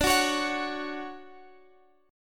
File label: D-Major 7th D down Guitar Standard; DM7 strummed; capo=3